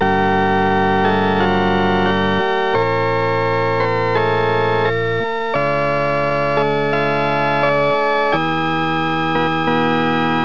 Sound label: ORGANO C; Lord of Alcandria